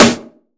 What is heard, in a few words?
crunchy snare drum sample a sharp key, steel snare drum sample tuned to the A# note